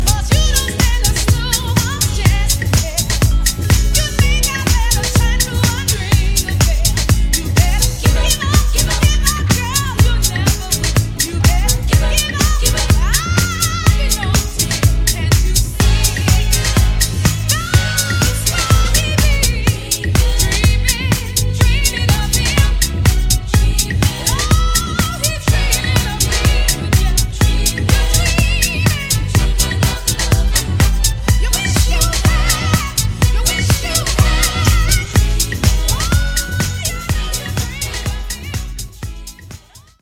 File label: Acapella Voc